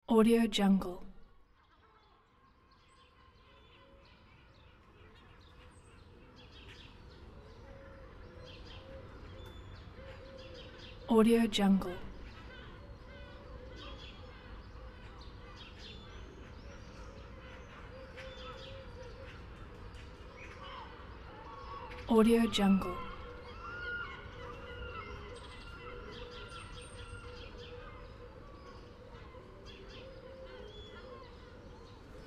sea ambiences town square screams chirps quiet; urban sounds

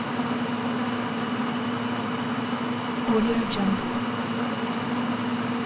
bar large ice maker loop; فواره آب; urban sounds

